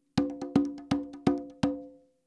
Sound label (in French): frise, congas